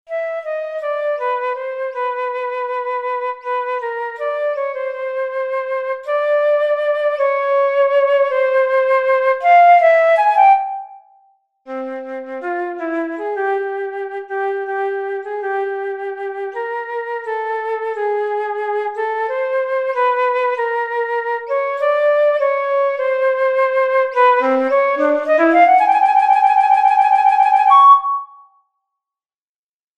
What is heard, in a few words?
flute solo 12edo; flute-compare-tunings